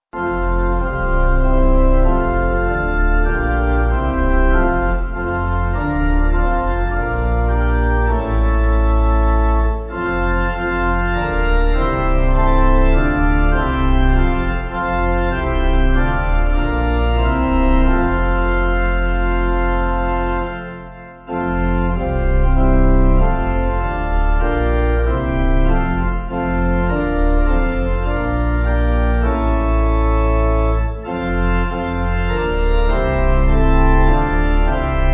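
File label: Pipe organ, church music backing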